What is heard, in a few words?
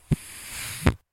windy